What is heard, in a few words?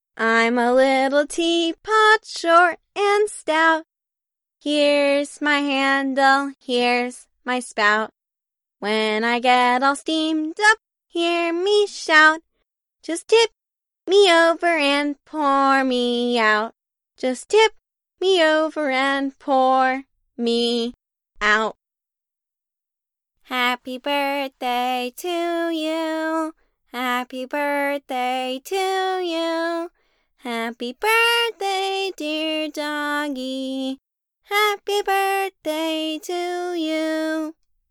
Singing